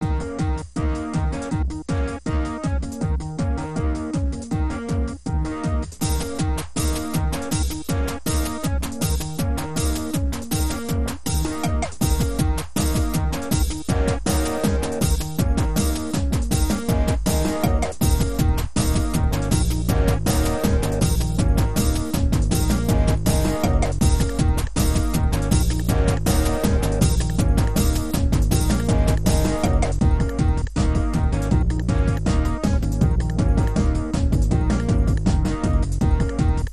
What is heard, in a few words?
Distort Uncut